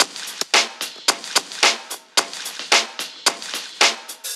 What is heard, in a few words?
junk hip hop 110bpm